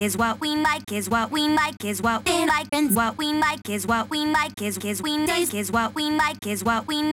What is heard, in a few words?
vocals, 130BPM; Pony Fan Music Remix Stems